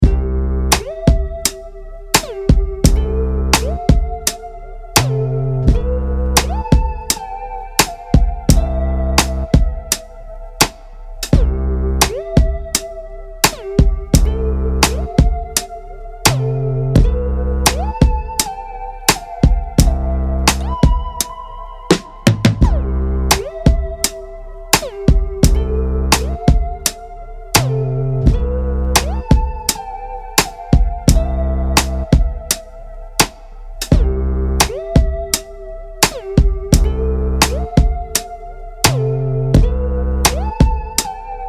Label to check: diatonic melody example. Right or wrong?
Right